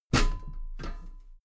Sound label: step metal ladder step top heavy; schritte